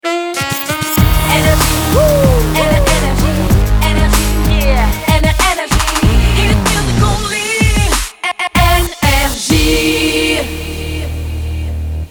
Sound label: jingle; NRJ